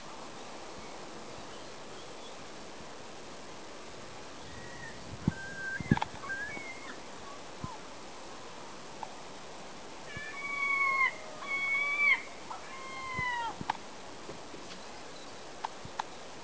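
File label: herring gull shaldon mew call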